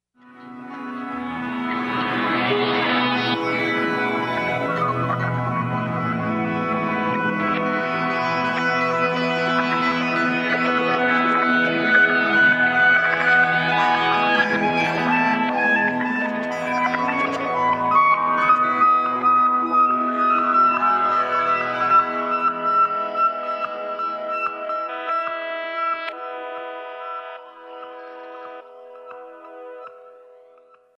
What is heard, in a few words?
skatin backwards